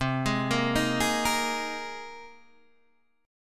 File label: C Minor 7th Sharp 5th C Guitar DADGAD